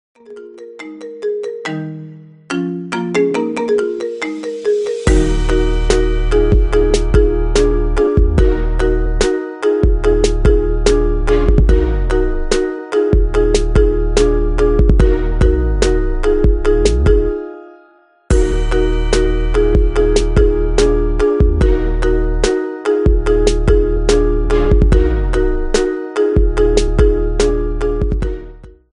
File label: Marimba Ringtone Remix